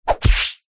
warfare sword or other weapon swing fast hit body squelch